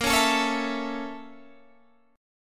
A sharp Minor Major 7th Flat 5th, down, Guitar, Standard; strummed; JGuitar